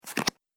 Cards Card Flick; Card Sound Effects; casino

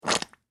Food Ketchup Bottle Squirt; Household

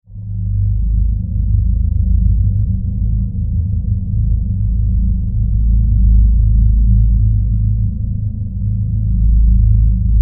Horror Drone Deep Rumble; Evil Sound Effects; Evil (Sound Effects:Horror)

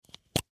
Inhaler Open, Inhaler Remove Metal Canister; Rustling; Foley; Objects; Blastwave

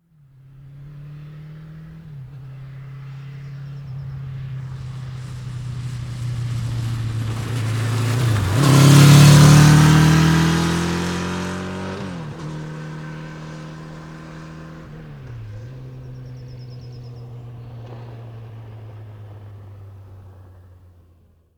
Motorbike Suzuki 750 Up and Medium Pass; Stop in Long shot; Motorcycles; Motorcycle Sound Effects

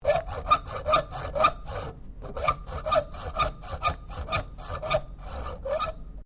SAW COPING SAW CUTTING STEEL

